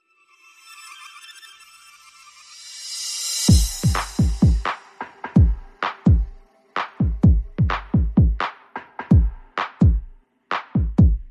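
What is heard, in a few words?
Percussion Only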